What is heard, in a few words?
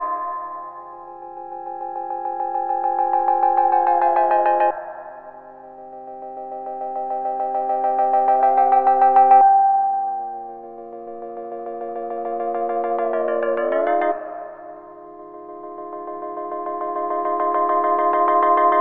Loop Meditation Pad FM Chords Fm 102